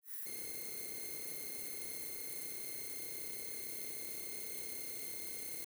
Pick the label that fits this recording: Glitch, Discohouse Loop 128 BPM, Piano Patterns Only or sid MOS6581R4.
Glitch